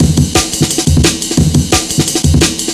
amen; Amen Breaks

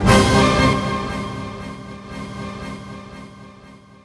ORCHFX, orchestra, Asian Traditions

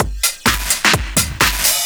Straight Loop 128 BPM, House

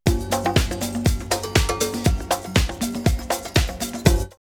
drumloops, OldSchool; X-Static Goldmine; 90 s Sample CDs

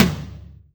Percussions

